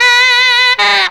FUNKY SAX; Zero-G; Funky Elements

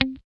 GUI C4, E-GUI MUTED; Sound & Vision; Gigapack I CD 2

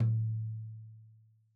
Tom2 Stereo, Deeply Sampled Drums; SMDrum

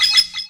jungle sound; Graz

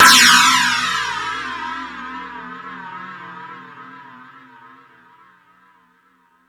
Space Drums, Drum Machines

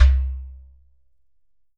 GHATAM

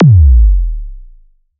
C3, SynthLine; 90 s Sample CDs; NiceBeats; SynthLine VOL-1

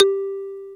marimba G5; Greytsounds; Scott Peers Sound Engineering

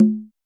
hi conga; Greytsounds; Scott Peers Sound Engineering

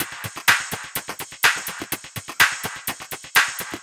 125 No Bd, Drum Loops 125bpm; Nu Dance Electro

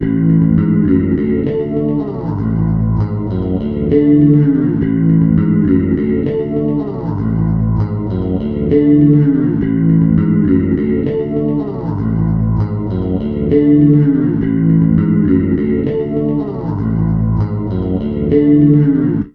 Bass Guitar Loops

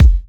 Kick, Kickdrum; Equipped Music; Loungin House

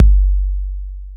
THE 808, ROLAND, DRUMS + PERC; Rubber Chicken Software Sample Bank

